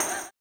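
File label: tambourine, 160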